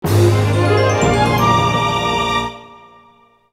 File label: Fanfare Heart Container; Get a Heart Container